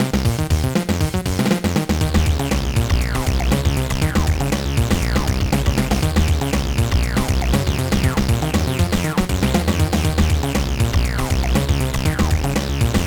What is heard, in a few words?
BGM; Ecks vs. Sever; gba